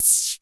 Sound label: NOIZ, Dual Percussion Synthesiser; Klone